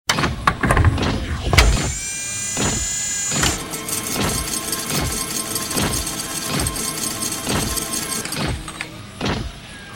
dload, dark matter mining

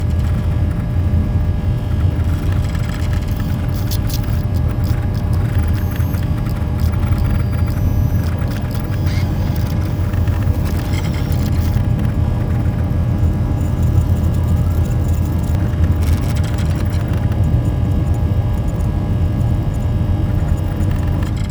cockpit; ships Rooms; CosmicRage

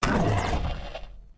hurt, cylarkan, creatures; combat; CosmicRageSounds

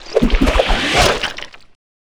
dong; CosmicRage; socials

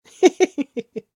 female chuckle; CosmicRage; socials; Gendered social sounds

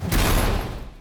flesh; CosmicRage; general combat abilities; rocket sounds

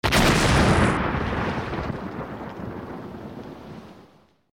other destroyed, ships combat

